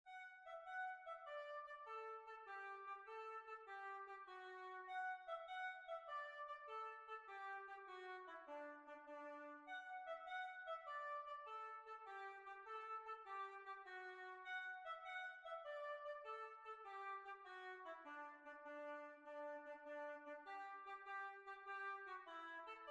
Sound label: Kings Bridge Assembly